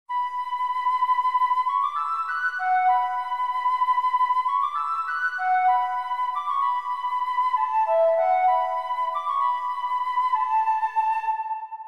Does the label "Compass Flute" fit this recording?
Yes